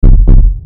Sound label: zombie heartbeat; zombies